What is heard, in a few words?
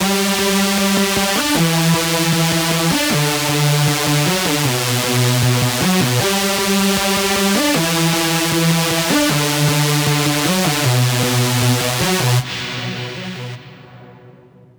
lead loop Emin 155; Music Loops; GhostHack